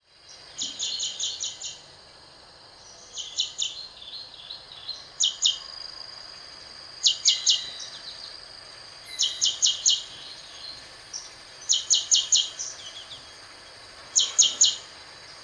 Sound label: Rufescent Prinia Prinia rufescens dalatensis, rufescent prinia call